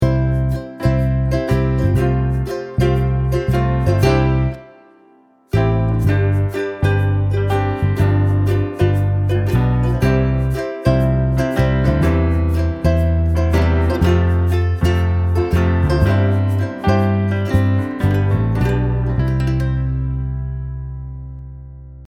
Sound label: nature box backing; Engelsk